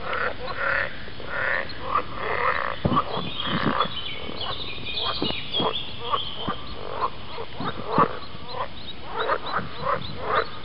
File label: Marsh Frog